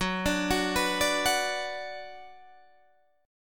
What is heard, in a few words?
Gb Suspended 4th Sharp 5th, Guitar, Standard; arpeggiated; 14,17,16,16,15,14